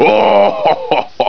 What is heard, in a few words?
LAUGH; psion cd vol1